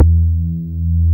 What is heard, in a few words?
BAS Mini; Best Service Gigapack 2